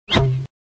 fun button; Cars; tractor tip

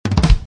drum fill

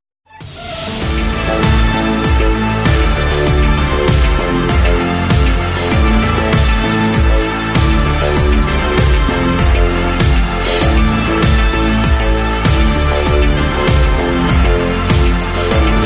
remixed; tron

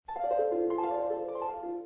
gliss down; snow white

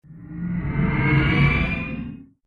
intro transition; time jumper

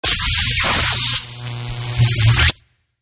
zap; inator overload

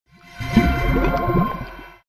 liguidsound; time jumper

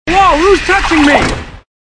roar; cars; tractor tip; frank

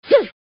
jump; pair of kings; king of the climb

